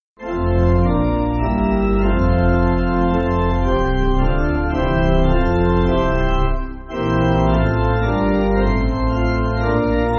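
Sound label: Pipe Organ, Music Backing Files for Churches; Man Think Of Repentance; Swiss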